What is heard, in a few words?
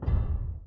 Foot l